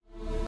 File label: mood intro dramatic; viego